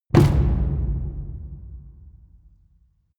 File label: drum mid loose